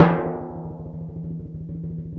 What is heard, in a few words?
Orchestral Perc, Timp FX